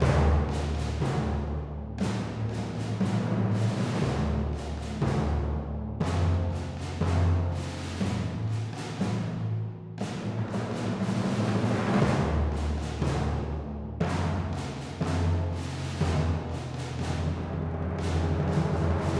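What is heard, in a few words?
Sad Timpani and Snare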